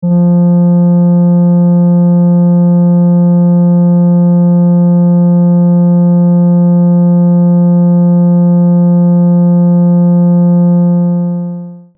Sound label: Malekko Manther VCO Tri Shape Noise